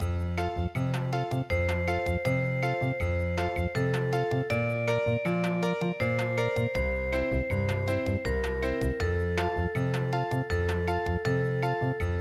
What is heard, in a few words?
British Bossanova